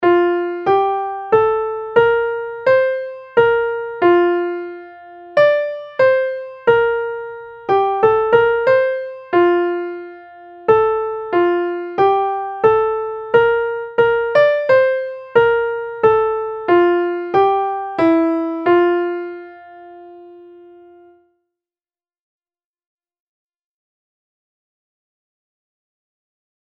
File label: Melody; Practice Exam Example 1